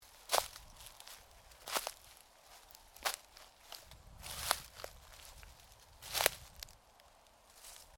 Footsteps forest, Footsteps: walking through forest, dry undergrowth; Footstep Sound Effects; Stuart Duffield